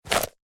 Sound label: drag